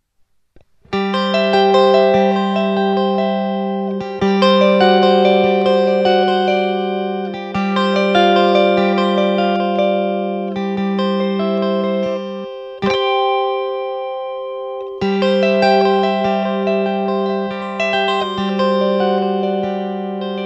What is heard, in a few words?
bouzouki